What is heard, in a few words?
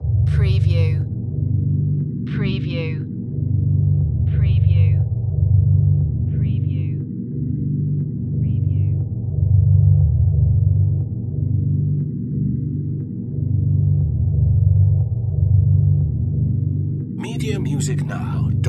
Space Bass